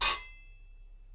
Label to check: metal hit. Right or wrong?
Right